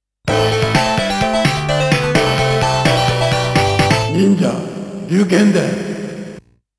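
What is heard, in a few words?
Round Clear (arcade)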